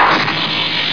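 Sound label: force field; JOHNNY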